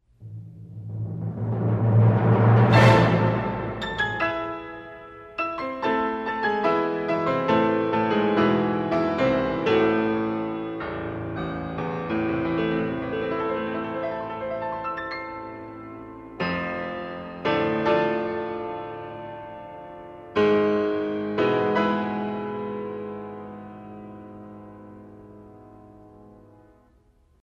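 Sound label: Concerto in A minor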